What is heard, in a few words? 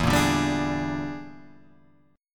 E 7th Sharp 9th Flat 5th, chord